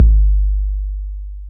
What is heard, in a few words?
Bass Drop, 808's; Street Anthems Sound Kit